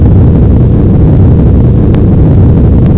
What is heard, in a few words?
rpg7 rocket fly